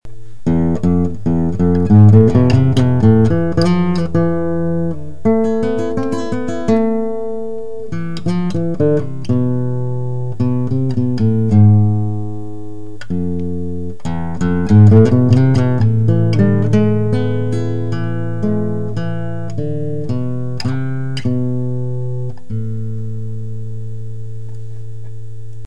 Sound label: spanish scale improv, classical guitar